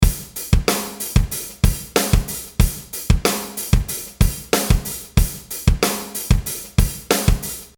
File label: maserati drum